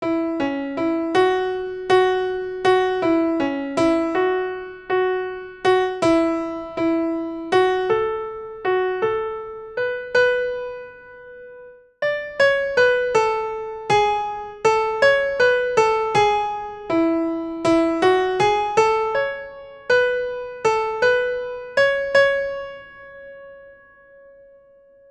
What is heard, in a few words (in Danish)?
Sopran